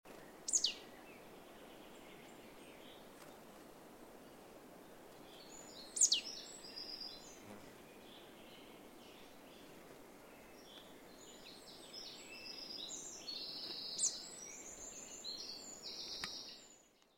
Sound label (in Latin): Poecile palustris; SETE